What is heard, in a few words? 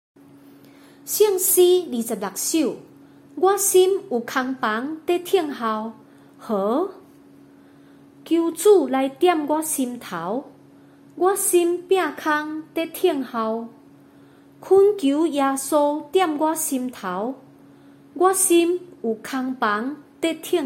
chorus, hymns for christmas; there is room in my heart for thee